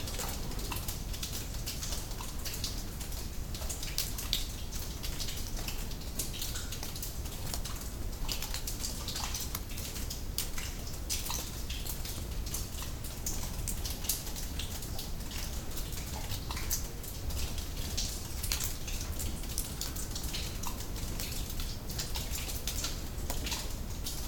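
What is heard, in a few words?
Bolivia Sorata San Pedro Caves Ambience Interior; Bolivia Nature; Pachamedias; Bolivia Nature Vol.1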